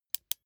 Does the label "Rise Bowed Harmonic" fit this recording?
No